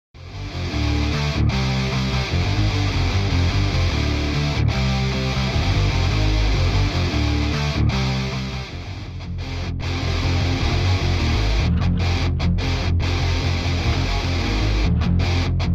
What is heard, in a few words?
Fierce Rock Guitars; Pulsed Records